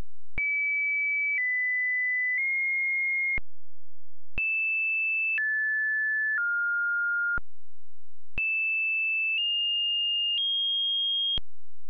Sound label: pakistani poverty sine